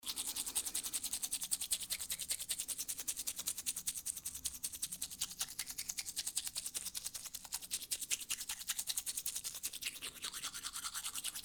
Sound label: brushing teeth